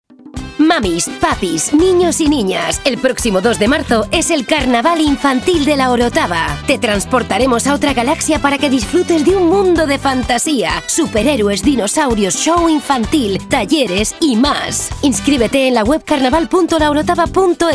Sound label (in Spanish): carnaval infantil; La Orotava; Cuña promocional